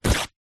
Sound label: paper towel kitchen roll rip; zapsplat; foley